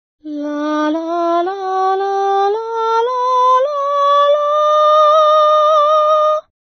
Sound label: Chest and Head Voices